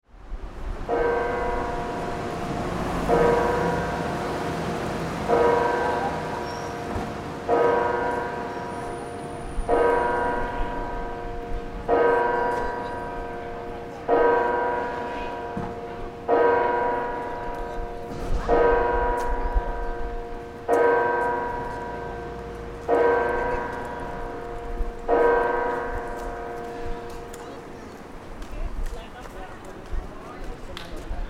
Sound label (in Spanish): CAMPANAS SANTUARIO ISLA DE MAIPO 12 DEL DIA; paisajes sonoros